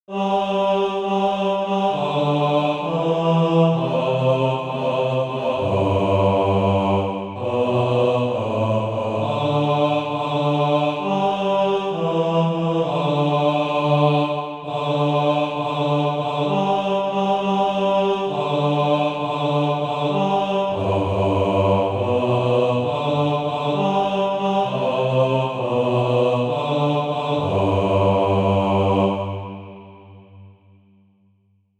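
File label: bass, Practise Music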